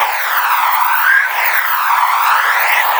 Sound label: cryophoenix basicattack; LoL; anivia